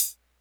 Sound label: hihat, Hardcore Breakbeat; Big Fish Audio; Hardcore Breakbeat Action